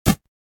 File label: step digital